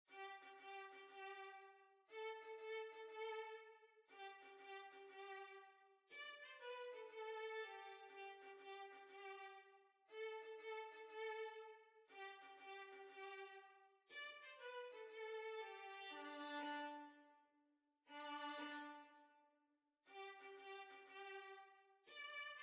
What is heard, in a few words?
Violin